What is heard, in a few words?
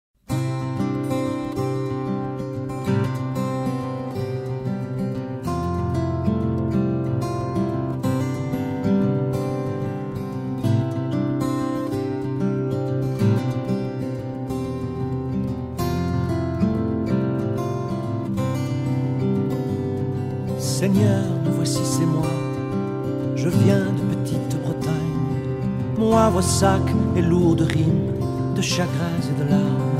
solo; xavier grall